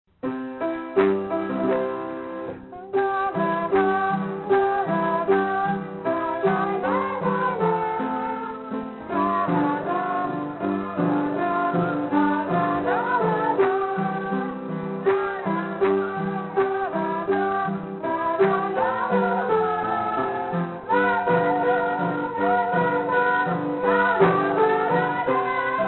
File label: Channukah, Rhythm and Drumming Ensemble